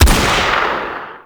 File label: Sniper Rifle; Grineer; Female; Weapons